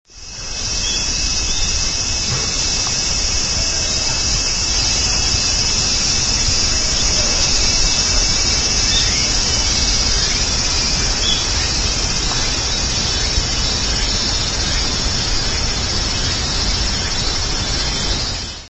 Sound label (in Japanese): koe abrasemi